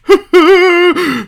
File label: zombi idle; garrysmod